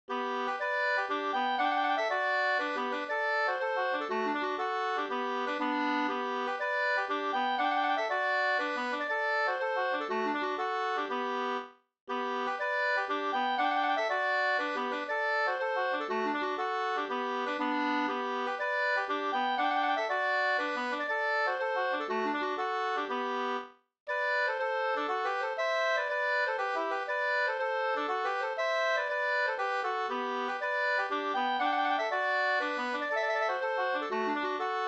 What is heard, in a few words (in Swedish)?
hamburska